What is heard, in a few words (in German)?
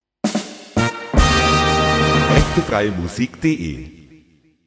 Tusch tata